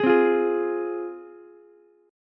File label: C Suspended 4th Sharp 5th C Ukulele; strummed; 13,12,13,11; JGuitar